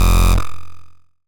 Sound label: Dist, Distortion; Bitkits